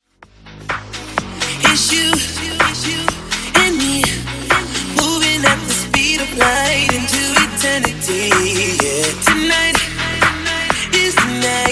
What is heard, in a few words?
Funk 126 Bpm